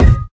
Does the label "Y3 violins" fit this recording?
No